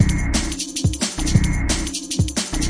offbeat thuddy bass, drum loops and breaks, 178 bpm; 161 to 180 bpm